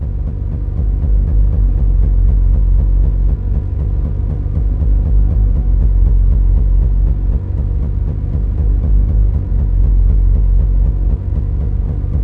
THROB, MACHINE THROB; Zero-G Ambient 1

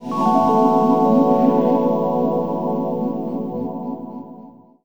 Traenon Rhodes; M8

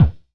Punch Psy Zone, Kicks; Airwindows

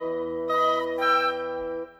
Winds, Orchestral; Sonic Foundry (Sony Creative Software); Orchestral VOL-2; Modern 35

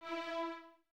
Violins Detache E 4; Miroslav Vitous; String Ensembles

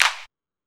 Techno, EMU XL-7

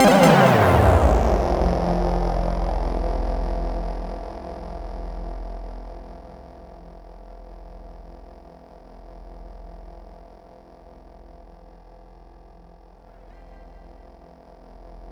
C4, SynthLine; 90 s Sample CDs; NiceBeats; SynthLine VOL-7

